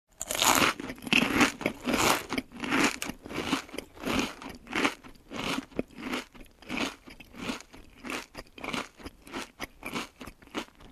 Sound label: Food Bite and Chew with Gulp